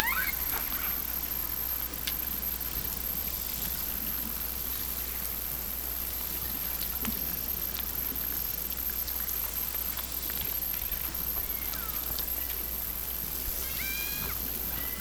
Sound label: Hydrophones, orcasound